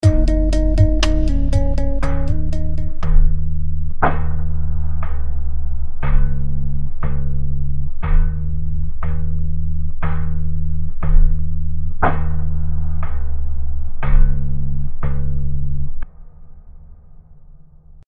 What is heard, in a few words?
HalfTime Transition